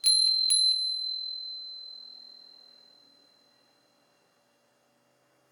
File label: Windchime; Windchime2 3; gamerip; elin